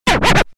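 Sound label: trenie i skretch dlya animacii, friction and scratch for animation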